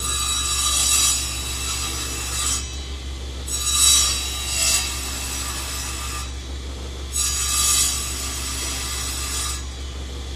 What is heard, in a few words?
Table Saw Cutting Sm